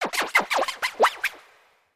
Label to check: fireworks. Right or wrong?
Wrong